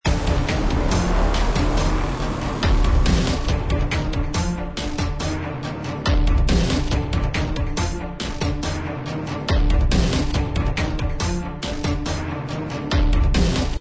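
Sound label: Epic Hybrid Dubstep Strings Ostinato Loop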